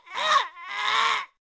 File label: Death Vocals; Barrel Roller